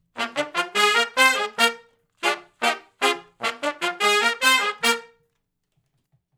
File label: R B Riff (Bb) uni to har, Horncraft for R&B; Sections